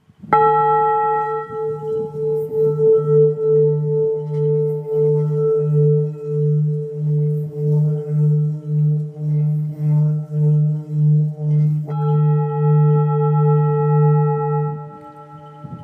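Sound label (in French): Full moon re, bols chantants tibétains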